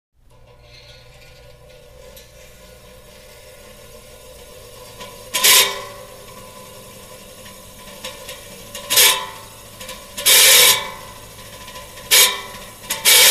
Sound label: Fan Small Broken; On, Runs With Very Bad Big Single Blade Scrapes, Off, Close Perspective